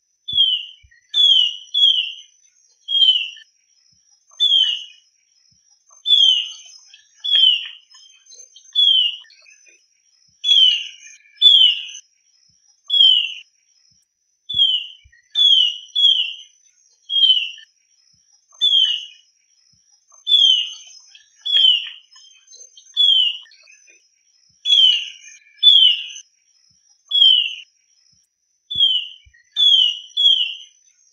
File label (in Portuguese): canto do choquinha de rabo cintado